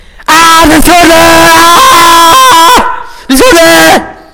aahhhh lautes Gebrüll; Geräusche zur Fantasygeschichte und zweitem Schüler-Podcast

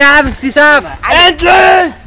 Freude über das Befreien; Freude übr das Befreien aus dem Bunker

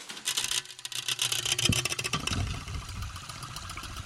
Oldtimer; Geräusche zu den Klangexperimenten

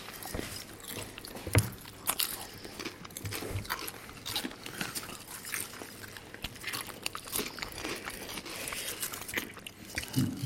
Chips Essen; Erdkraft beim Essen der Chips; Klangexperimenten

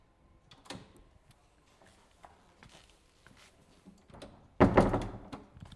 Tür öffnen durchgehen schließen

